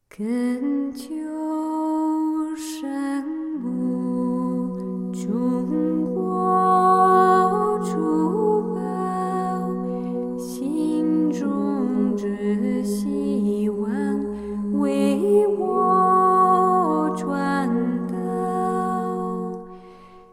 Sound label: Chinese marian song; Regina cinarum